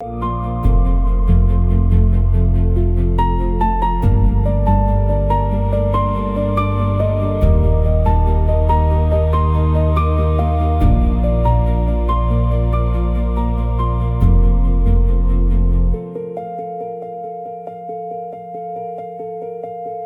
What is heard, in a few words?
loop; bgm